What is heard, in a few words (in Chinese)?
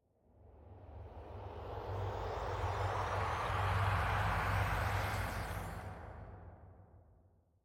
灵魂沙峡谷低鸣声; ambient